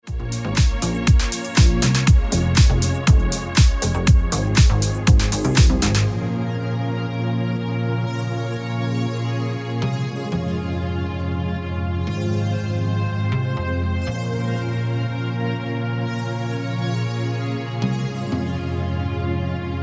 Remix Ringtone

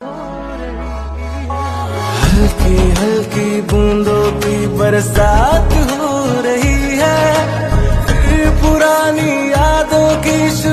Hindi Ringtone

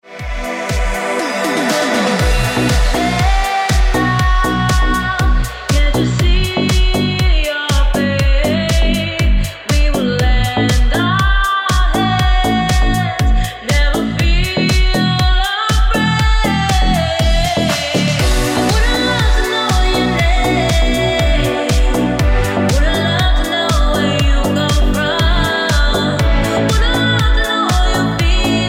Ringtone

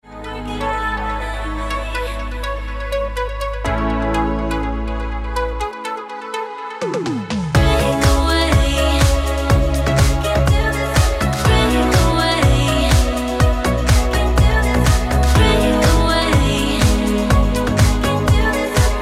Ringtone

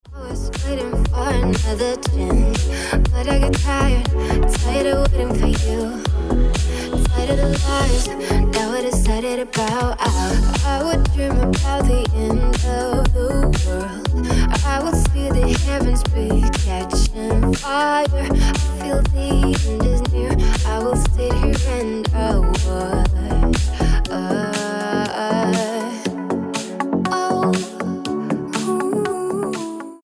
Ringtone

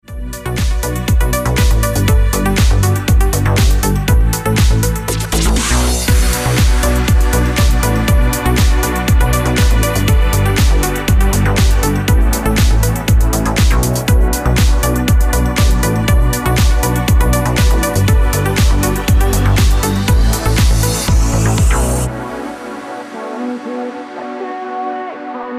Ringtone

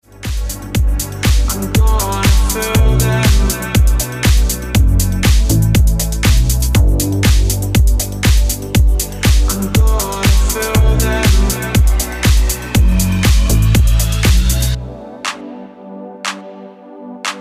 Original Mix Ringtone

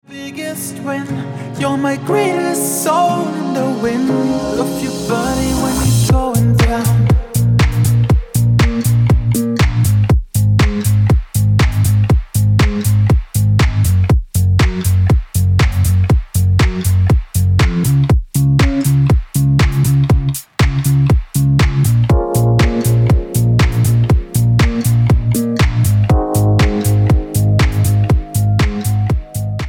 Original Mix Ringtone

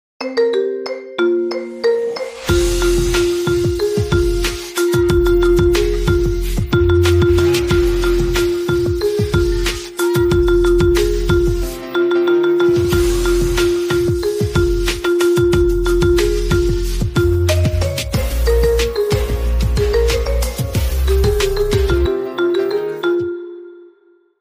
Marimba Ringtone

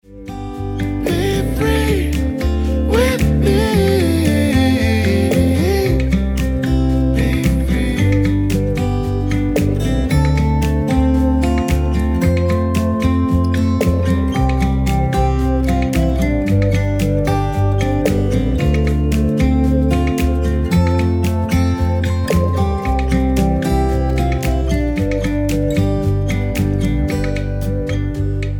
Acoustic Version Ringtone

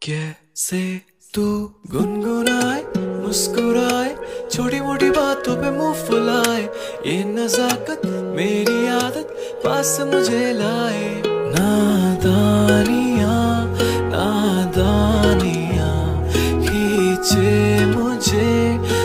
Hindi Song Ringtone

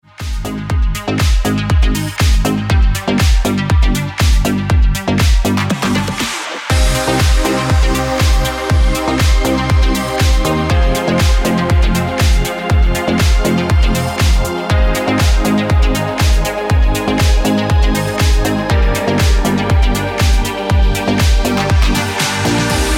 Ringtone

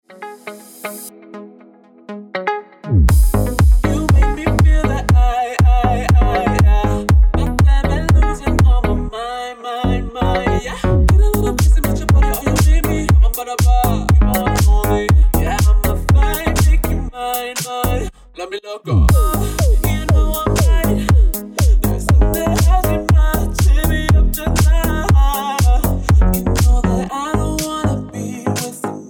Original Mix Ringtone

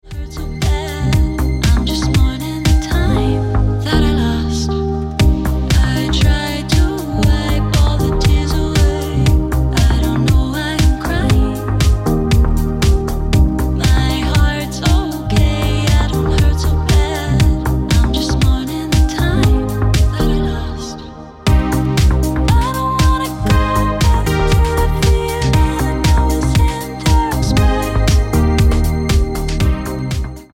Ringtone

